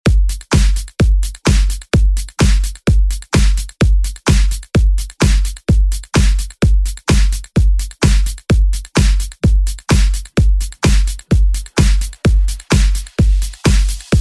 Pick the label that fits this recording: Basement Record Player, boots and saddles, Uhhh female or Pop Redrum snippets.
Pop Redrum snippets